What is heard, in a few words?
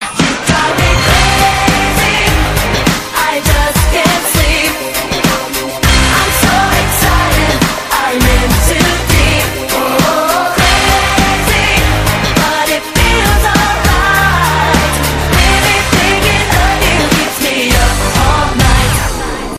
crazy chorus